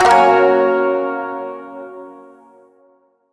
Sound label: Shawzin Hirajoshi Chord J; Chords; Warframe